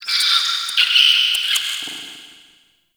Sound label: Rat Vocal, Crypt Ambience; One Offs; Champion Crypt